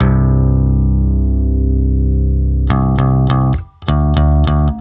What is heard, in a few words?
Chord; Rock & Pop